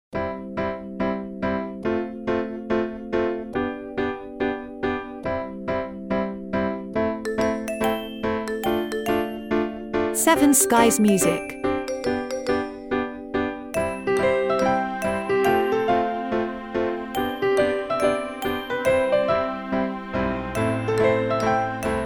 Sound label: Piano Smiles